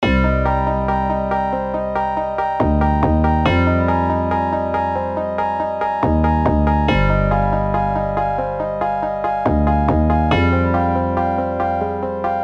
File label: twinkling